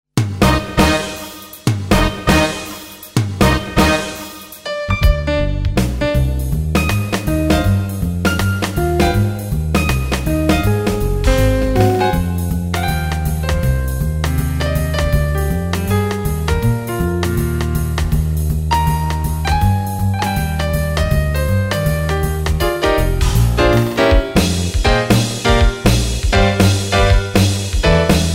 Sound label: Jam a Jazz